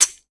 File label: Beat Box Shaker